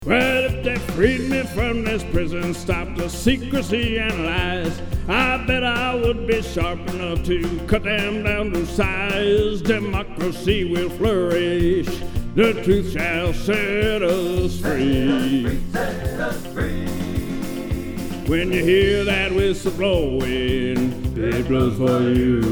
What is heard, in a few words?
Whistle